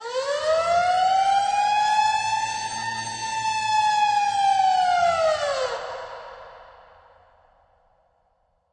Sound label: Siren Amb Loop